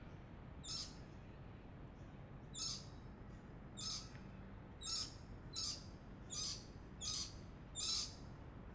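lorikeet chirps, Rainbow Lorikeet recorded: Brisbane, Australia, morning, post-dawn chorus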